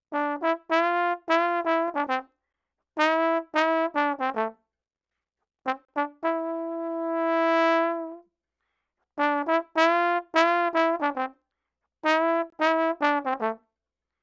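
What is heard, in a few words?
106 Latin Jazz Trombone C major; Brass; Pro Tools Essential Loops; Digidesign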